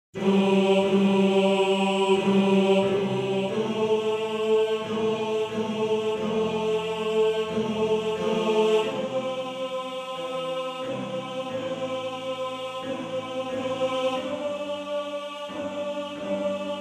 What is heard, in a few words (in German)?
Tenor; Morgenrot; Tenor 1